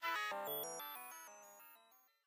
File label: fall; blitzmax; hitoro